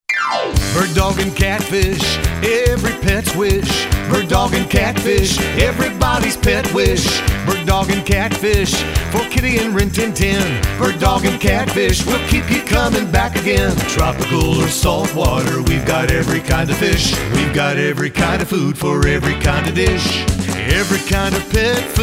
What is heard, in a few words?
jingle